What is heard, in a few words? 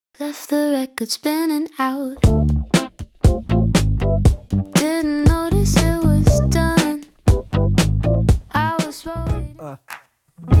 Indie Vibes; Retail Cosmetics